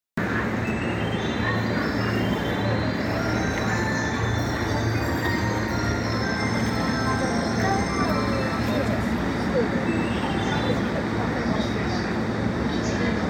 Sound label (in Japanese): 京浜東北線北浦和南, train sounds